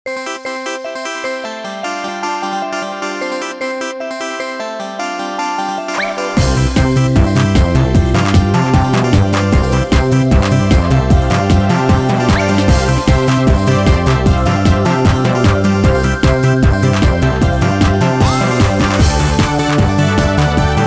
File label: jingle